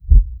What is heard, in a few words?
Heart; In the Line of Duty Firefighter